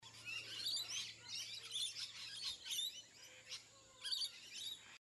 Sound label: Loriini birds sound